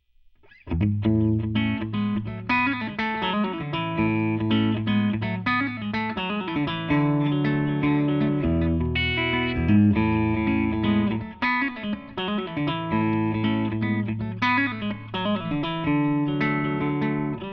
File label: Tele Clean; egnater